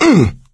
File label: hurt minor, survivor voice; Left 4 Dead 2; coach